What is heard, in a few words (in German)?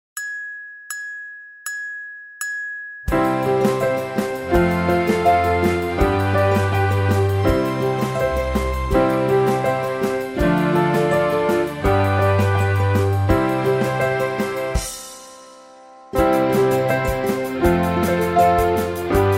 Lied instrumental; Haensel und Gretel